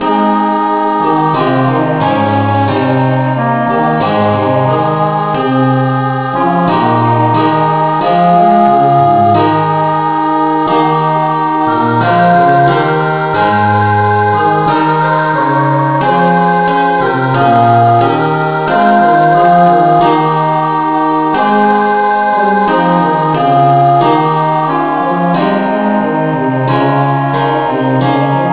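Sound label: key A flat, no vocal; christe Sanctorum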